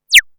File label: Little Bird